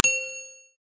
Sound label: success